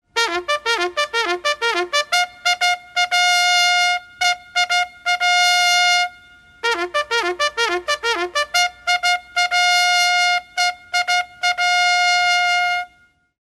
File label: bugle call to arms; wake-up tones